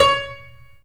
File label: PLUCKS, The Piano; InVision Interactive